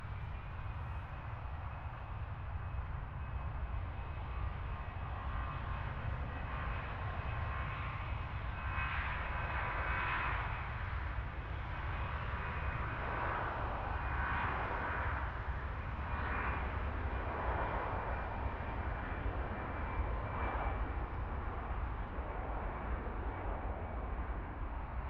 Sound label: ambience airplane far trafic; Dolby Atmos